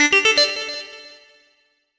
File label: Rapid alert; PlusFourSix; Josephine (G700)